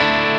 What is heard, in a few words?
0 3 2 0 Dadd9